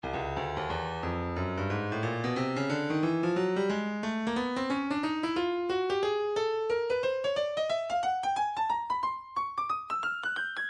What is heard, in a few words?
breakbeat 090 bpm; Stylus; Nu Skool